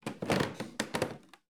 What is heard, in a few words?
footsteps wood creak stair case; collanderson